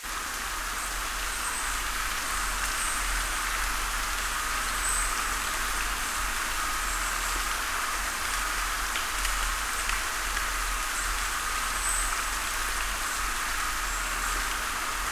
rain meditative

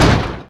cannon

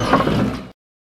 Gears

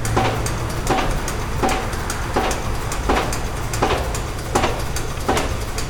mech

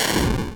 Bomb Explode

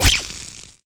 energy shot

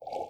Paddle

